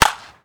Baseball Bat Crack

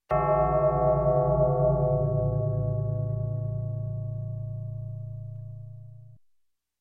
Space Space Clock, Strikes 100, Electronic; Sound Ideas 2000 Series

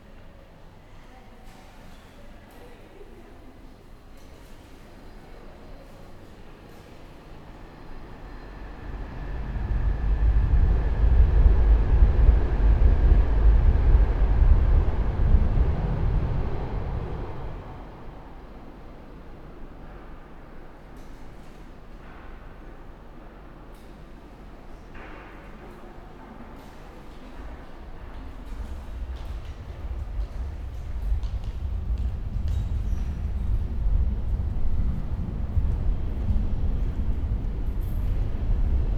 Urban train station underground rumbling; urban and suburban; boom library

